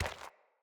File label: Walk Gravel, Footsteps; Enemy; Mockery; Mockeries